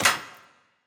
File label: Shrapnel Hit